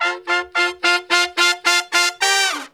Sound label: BRAZ LICK, BRASS LICKS